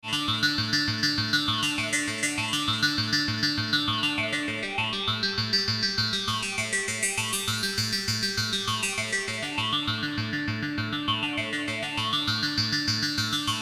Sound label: Equinox ARP